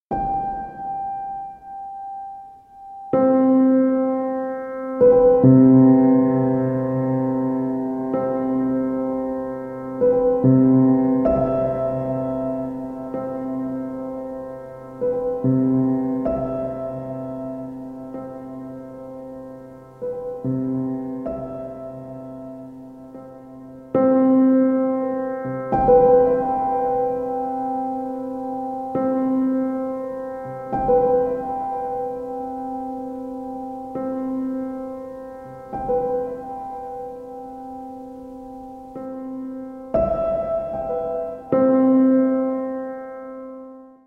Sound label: Piano Sounds